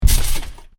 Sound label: Trampoline seatdrop, Trampoline, bounce on: seatdrop on canvas trampoline; Miscellaneous Sport; Stuart Duffield